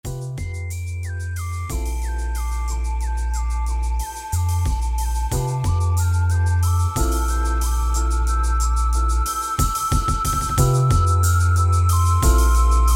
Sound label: Spacial Jazz